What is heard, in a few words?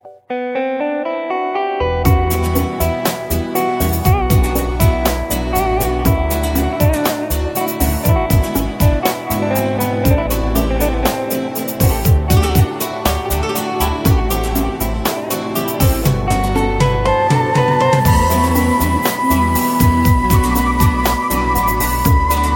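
koptskaya muzika, Coptic music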